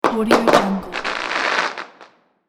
firework display rockets

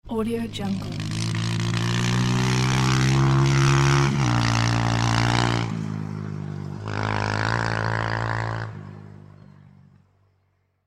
motorcycle passing

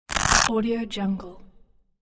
velcro sound

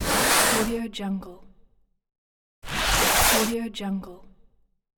box slide

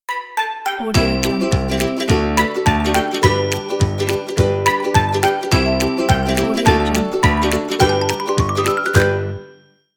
happy child logo

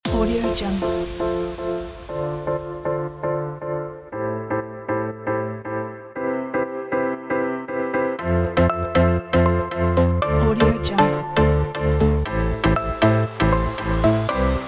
house positive emotion

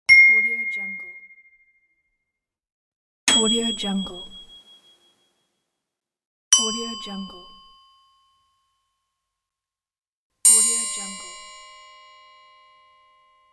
ding sound pack

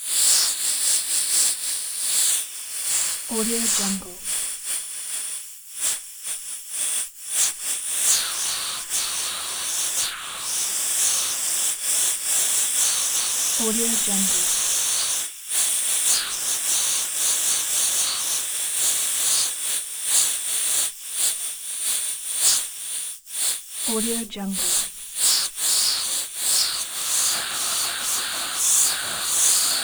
dynamic suction wind; lokohighman

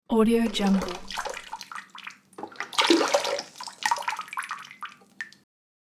water sink effect

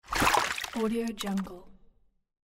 fishing fish catching with net

